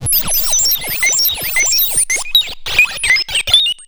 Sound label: Droid Helper, 8-Bit; Games And 8-Bit Kit Volume2; atomsplitteraudio; chiptune